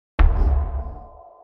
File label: alert sound